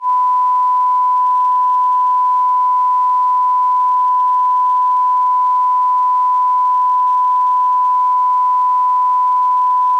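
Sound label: mic bias disable 1 kHz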